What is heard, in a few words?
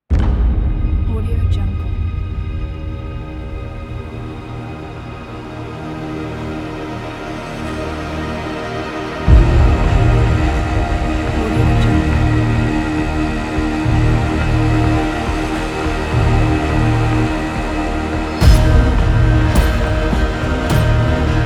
medieval viking cinematic soundtrack